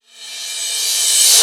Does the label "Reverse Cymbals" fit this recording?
Yes